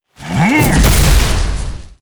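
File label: attack, monster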